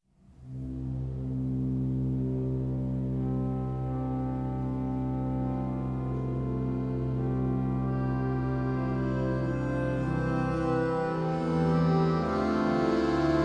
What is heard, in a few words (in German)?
Physharmonika